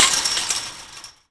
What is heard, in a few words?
door open chain fence